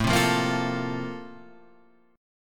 Bb Major 7th Suspended 2nd

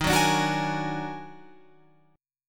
D sharp Minor Major 11th D sharp, Guitar Open F; strummed

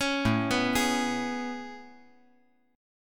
A Major 9th A

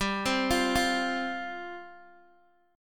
G 7th Suspended 4th G down Guitar Open D

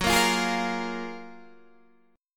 Gb Major 9th, down, Guitar, DADGAD

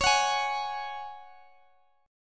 Db Suspended 4th, Guitar, DADGAD; strummed; x,x,x,18,21,18

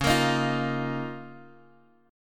C sharp 6th Add 9th, C sharp, Guitar, Drop D; down; chord shape

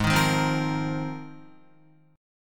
Ab 6th Add 9th Ab, Guitar; Ab6add9 strummed

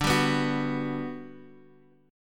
G Major 7th, D

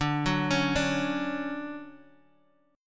D-Major 7th D down Guitar Half Step Down

